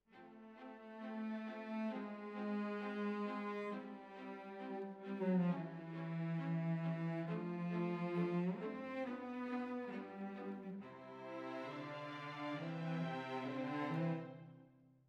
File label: Cellos Over